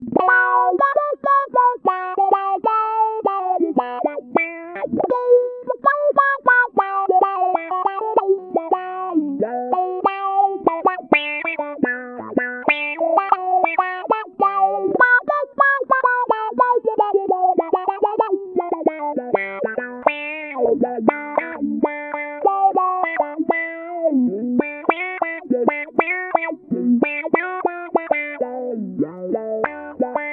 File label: envelope melody